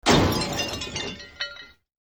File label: crash